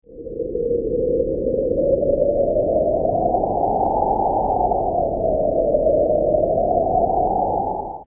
Gentle wind

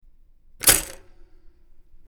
Toaster

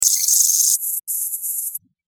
Tech gadget buzz

